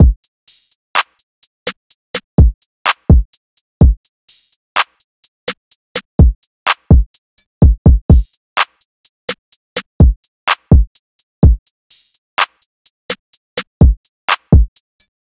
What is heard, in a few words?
Drum Loop 126 BPM, Hip Hop; Drum Loops; Casa; Cymatics